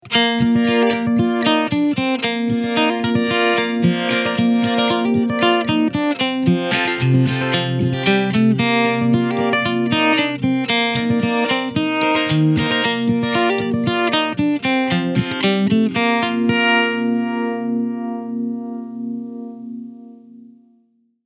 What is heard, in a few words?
vintage trem; mobius